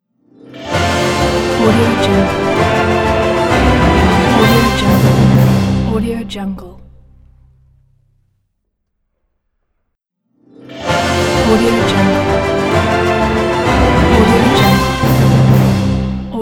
orchestral logo

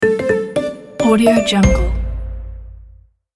logo sound branding

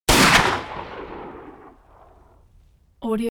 gun shot reverberant

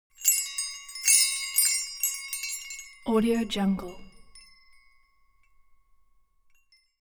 object metal windbells ringing; smartsoundfx

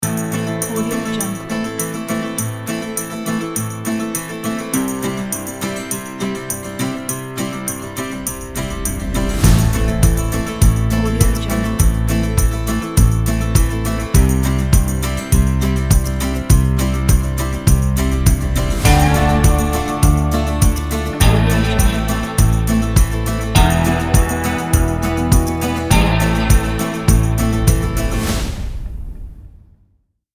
outlaw country

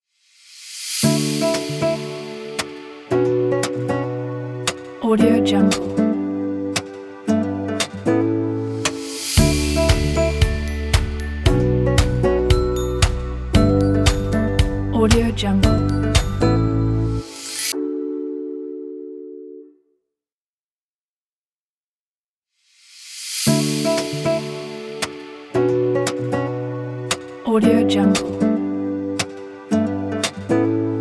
folk acoustic logo

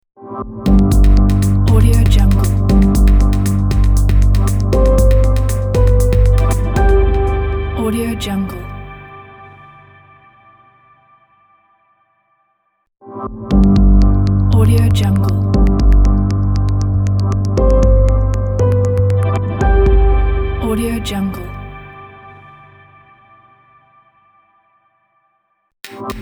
medical logo; bluexmusic

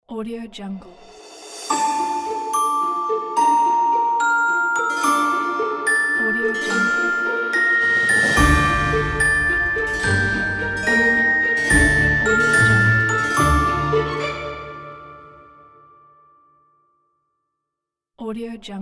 halloween logo; plaincask

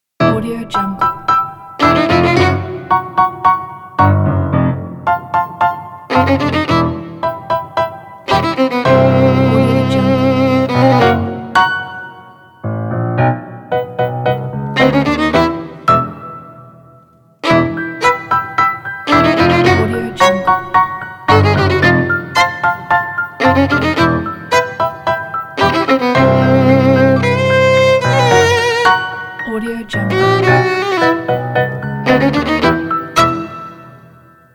comedy violin piano

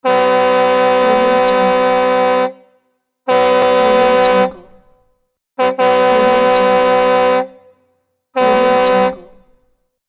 truck horns

